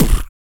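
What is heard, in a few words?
vox percussion, Vocal Planet Jazz FX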